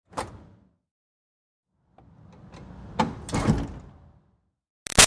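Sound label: Door Metal Commercial Pull Exterior Light Open Close; Doors; Big Room Sound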